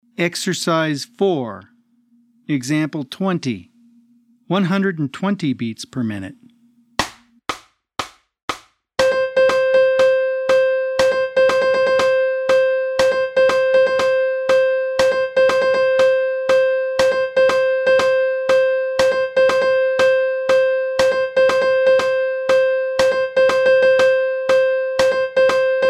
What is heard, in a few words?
120 BPM